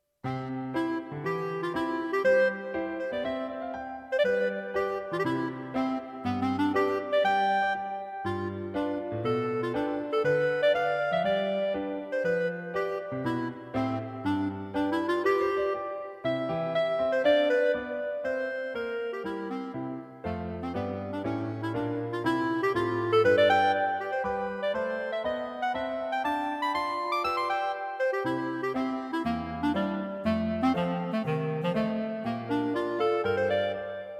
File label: Clarinet